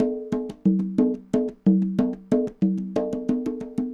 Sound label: conga 120 bpm, latin; Sound Effect Library